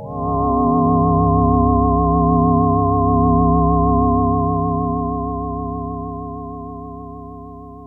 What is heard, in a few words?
PAD